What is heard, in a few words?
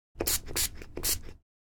Windex bottle cleaning spraying many times